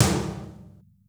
ROCK T4; Crypton - DrumBase5000; R70 KIT #2

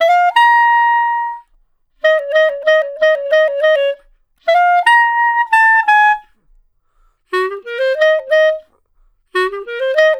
Clarinet One Shot, melody; Jazz Solos and Sections; Traditional; Clarinet Melody One Shots

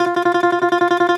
BALALAIKA, Russian Strings

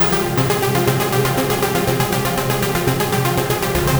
Trance Synth

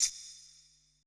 E SHELL, ETH PERC

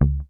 P BASS MUTES C#2

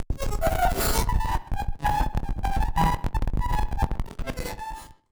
Battered Synth; malfunctioning synth samples

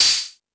Crash, Drum Machines E-MU Pro-Cussion

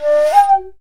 SHAKU FALL; World Combo

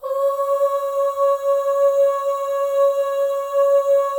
OH, FEM, Symphony of Voices; Spectrasonics

